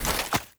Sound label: snowball equip; player; winter